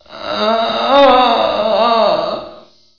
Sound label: moan